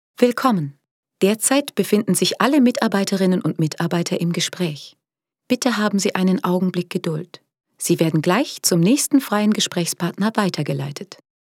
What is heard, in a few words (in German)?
telefonpaket warteschleife